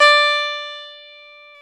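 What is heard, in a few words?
E 12 String guitar; Club-50 - Foundations Roland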